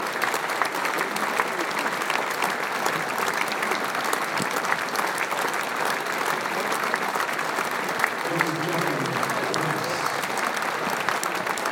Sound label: applause; for clive rice at trent bridge